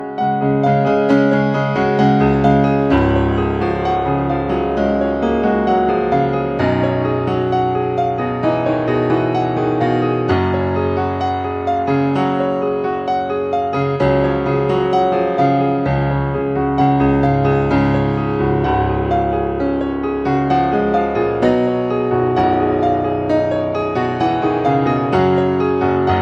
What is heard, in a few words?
samsung spring ringtone